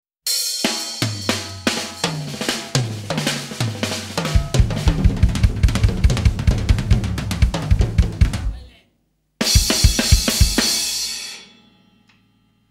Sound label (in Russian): solo na barabanah